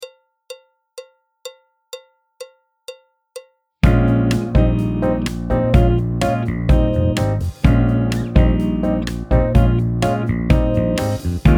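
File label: backing track, Jazz; clarinet, tenor; Jazz Syllabus Level 3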